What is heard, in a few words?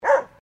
Animal Dog Dalmatian Female Bark Distant